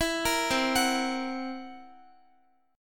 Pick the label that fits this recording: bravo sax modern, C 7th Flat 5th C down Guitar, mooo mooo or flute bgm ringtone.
C 7th Flat 5th C down Guitar